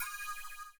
CYM, Ethno Techno; ILIO - Ethno Techno

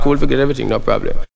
VOCALS; Ueberschall; Jam Box

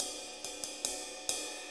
6-8 Ride, HiHat & Ride Loops

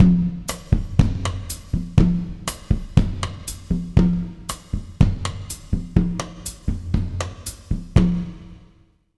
120 W side, jazz latin drumloops; USB Soundscan vol.08; AKAI

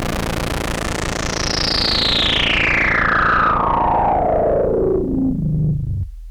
Monotron Sci-Fi

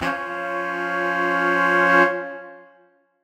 Horn Swell Fsus4min6; undercover samples

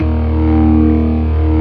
27 STOPS, organ; Keith Emerson Signature Series

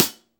HH CL, Drum 1 Dry; East Collexion

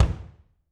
BD, Drums; Bob Clearmountain Drums I

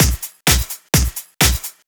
Straight Loop 128 BPM, House

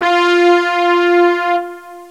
Ending horns; jazz jackrabbit 2

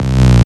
Basses Root C; Synth Shots and Basses; Vengeance - Essential Clubsounds Vol.4; Note C

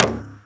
Percussions

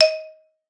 D#4, XYLOPHONE; Xsample VOL-4

